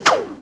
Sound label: Grappling Hook Throw; Throw the Grappling Hook